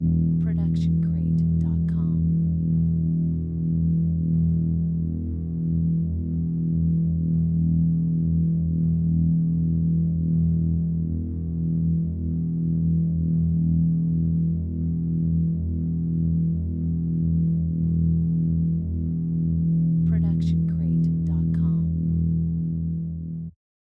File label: lightsword constant humming; soundscrate